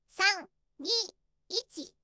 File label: shoot countdown